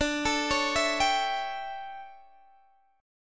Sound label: D Major 7th Suspended 2nd Suspended 4th, D, down, Guitar Open D